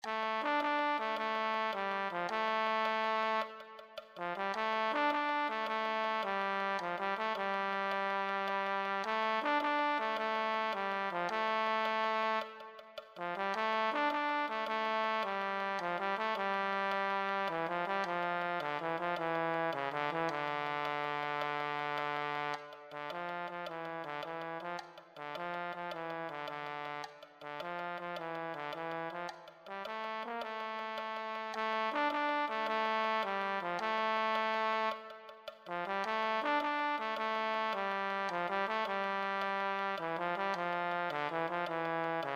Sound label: tenor saxophone